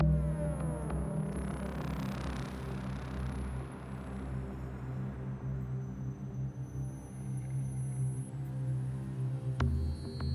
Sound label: Space Guitar Ambience; Landinar Into the Void